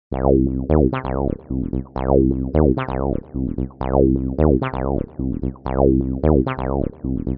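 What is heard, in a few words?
tb303 acidline, 130 bpm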